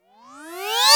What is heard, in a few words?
channel join